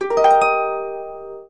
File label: achievement unlocked; quell memento nintendo 3ds gamerip